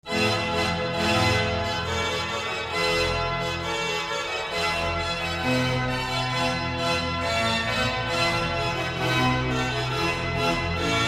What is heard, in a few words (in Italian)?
Ripieno ad uso di Orchestra; Luchesi; Marcon